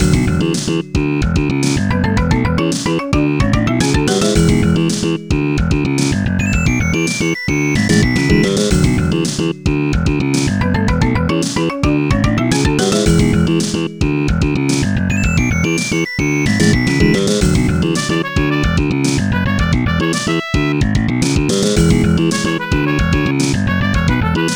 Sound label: LOOP COOL ENOUGH, ClassicalRetro; music; ClassicalRetro pack